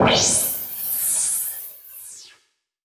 Title Transition Burst; Adobe; Production Element

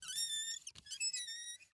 Creaks Wood Hinge; household

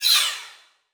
Multimedia Internet CD-Rom Flash Whoosh Short

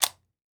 Weapon Gun Pistol 38 357 Revolver Chamber Lock; AdobeCC

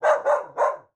Animal Dog Bark; animals; AdobeCC

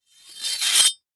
Weapon Sword Remove From Sheath; AdobeCC

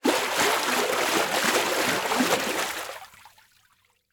Liquid Water Water Rapid Splash

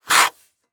Industry Tool Shovel Dig In Sand Short

